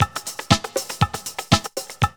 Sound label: LOOP, DRUM; MLOP DRUM; E-Lab; X Static Goldmine 2; akai